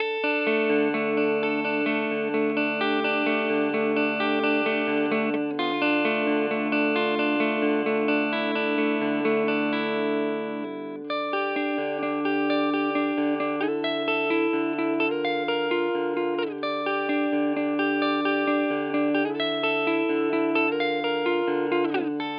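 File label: Hagstrom Clean Neck, guitar; Pat Smear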